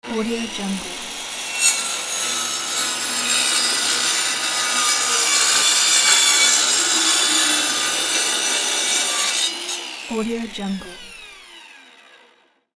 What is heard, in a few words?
circular saw single medium cut